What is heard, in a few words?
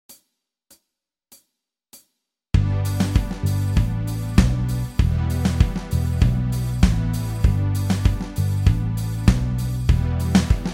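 98 BPM G E minor, song jams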